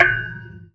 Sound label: Metallic Clang